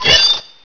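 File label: scorpion flinch; gearbox; lg scorp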